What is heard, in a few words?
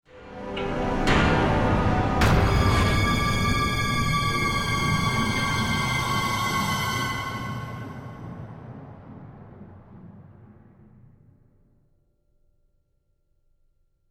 Stinger Decrease Action